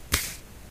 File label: card Shove